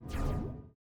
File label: Final Boss Teleport Out; unsighted; enemies